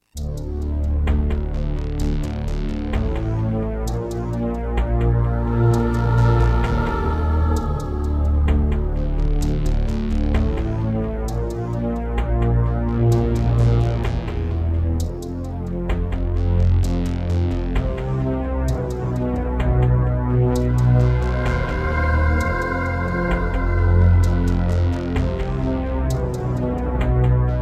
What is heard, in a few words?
Terratec MiniWaveSystem TTWT; Descent II